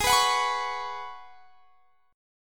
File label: Ab Minor 11th, Ab; Abm11; strummed; x,23,21,23,24,21